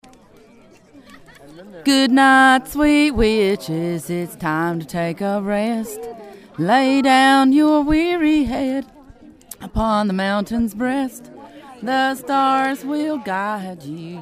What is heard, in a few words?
Good night sweet witches sang; Reclaiming Witchcamps USA 2010